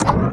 gravity on; cuttherope-holiday

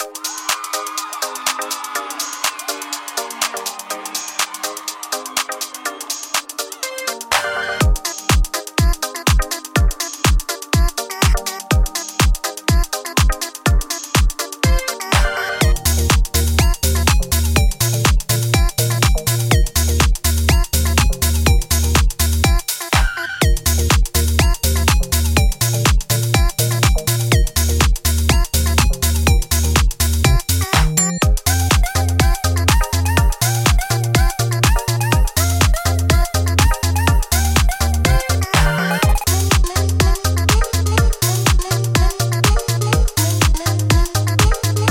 Inst; fridaynightfunkin

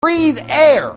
breath air; soundboard

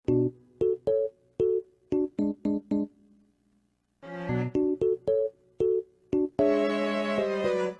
wii music; soundboard

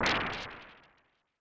asteroid hit; adarkroom

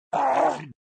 Zombie Out; Bob the Robber 2

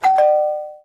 ring; cuttherope-holiday

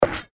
cancel Menu; fnfmidfight

